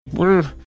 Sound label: funny sound